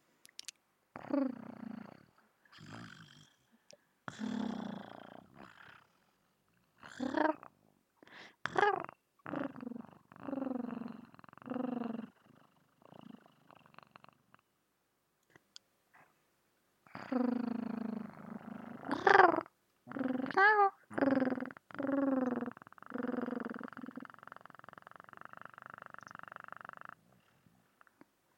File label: Purring